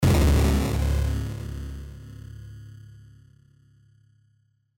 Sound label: Blast Distortion